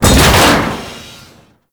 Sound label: Storage Container Cylinder Smash; Warframe